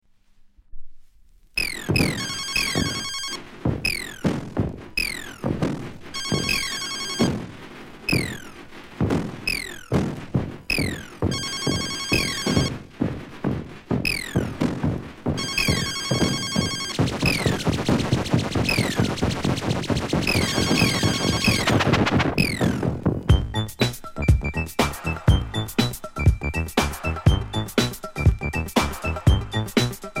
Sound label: techno